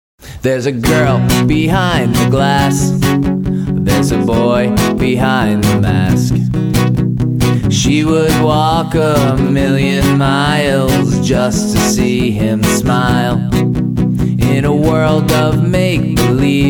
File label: lullabye